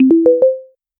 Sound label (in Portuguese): um chime curto e alegre